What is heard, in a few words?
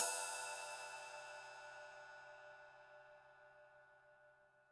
big ride sample f sharp key, ride cymbal drum sample tuned to the F# note